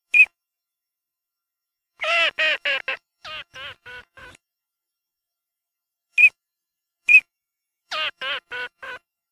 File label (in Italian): ALZAVOLA VOC